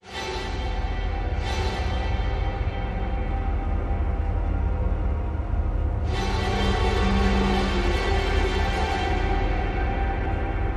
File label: Orchestra & Effects Only